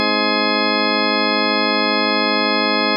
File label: octave Partials JI; third